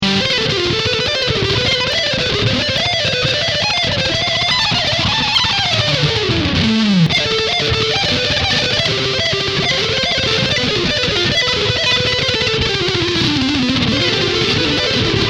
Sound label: ad30vt recto